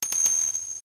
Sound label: level up